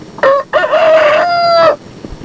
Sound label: peepers crow; Peepers Crowing; chickens